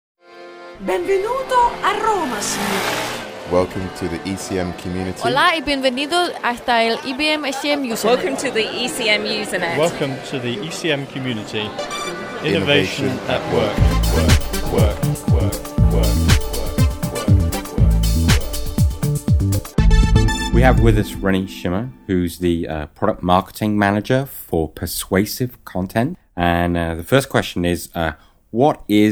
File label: IBM ROM